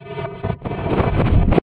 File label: Button Press Sweetene, stinger